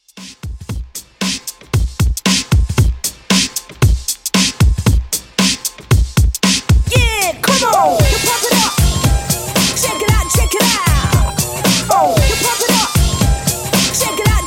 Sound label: 90s Redrum 115Bpm